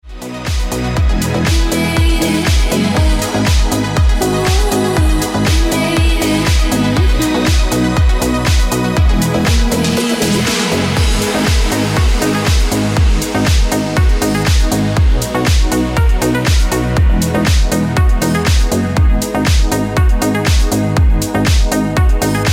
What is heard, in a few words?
Ringtone Deep House Music